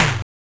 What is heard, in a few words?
Tom, Genesis; M8